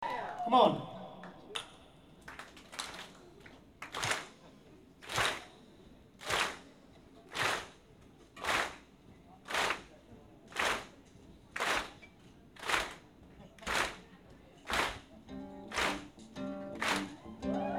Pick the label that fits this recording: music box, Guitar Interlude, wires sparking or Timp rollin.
Guitar Interlude